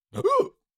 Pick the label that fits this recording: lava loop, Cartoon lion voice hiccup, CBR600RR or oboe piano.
Cartoon lion voice hiccup